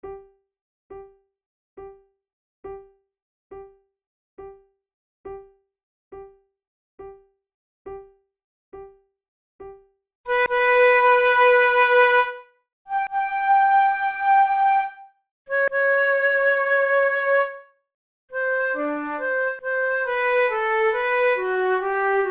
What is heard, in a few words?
fantasia per flauto solo in g major larghetto; mercadante